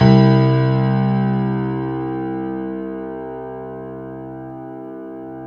Piano Grand; CMI

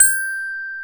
Glock; CMI

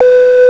ZAMPNA; CMI

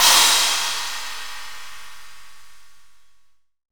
Kit Heavy; CMI

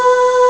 CHOIR, CMI

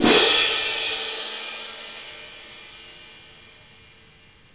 Crash Cyms; CMI

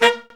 Brass Stab; CMI

